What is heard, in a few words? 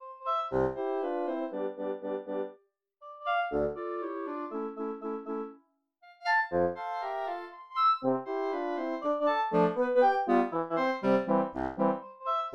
Bagatelle 8tet